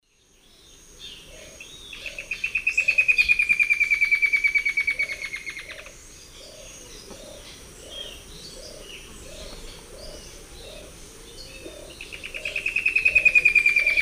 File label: Dendroplex picus, 2 song types; S. America; Eastern Lowlands